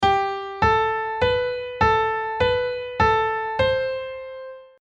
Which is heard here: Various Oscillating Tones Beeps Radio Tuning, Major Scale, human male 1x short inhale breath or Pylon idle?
Major Scale